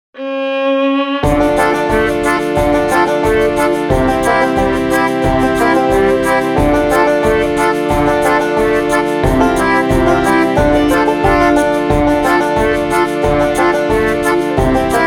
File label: Sea Shanty